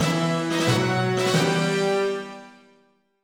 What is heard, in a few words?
Music Sting - Quest Start